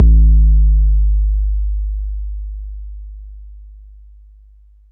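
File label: bass drum 808 Smooth C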